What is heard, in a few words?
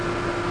conveyor; resonant-induction; assemblyline